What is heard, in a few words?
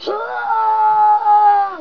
sing; valve; elf